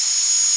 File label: turbo spin, simulated vehicles